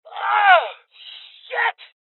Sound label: Injury Male; rebel cops 2019; Voices